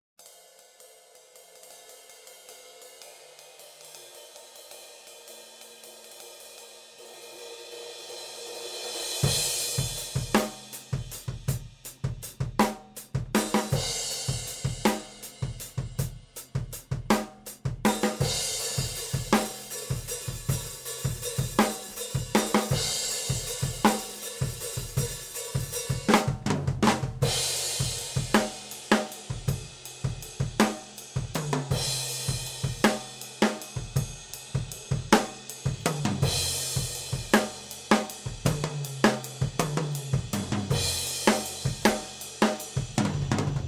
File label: Drum Overheads